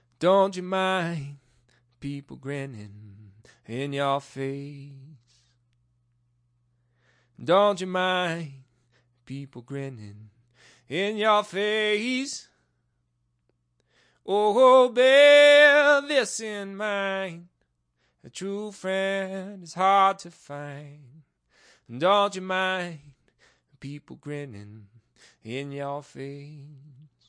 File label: acapella 132bpm, Blues; remixable collections